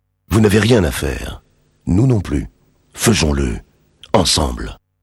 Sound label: jingle; dorval; supernana